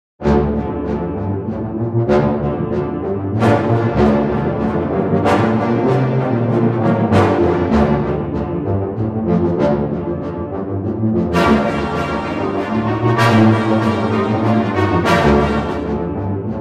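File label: Brass Designer Trumpet Motif Combo; TRP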